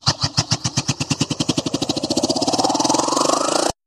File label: wheel protraction free games; Fruit Fiesta; Wazdan